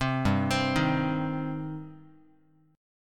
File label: G Suspended 4th Sharp 5th G, down, Guitar; Gsus4#5 arpeggiated; JGuitar